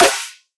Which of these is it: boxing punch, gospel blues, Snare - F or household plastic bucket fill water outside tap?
Snare - F